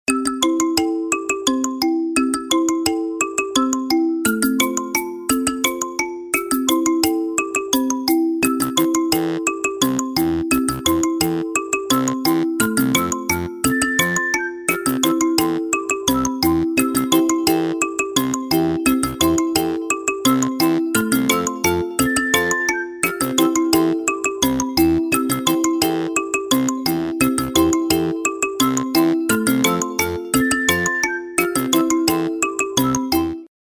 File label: Spring Outing, ringtones